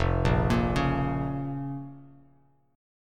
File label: F Suspended 4th Sharp 5th Bass Standard, chord; arpeggiated; JGuitar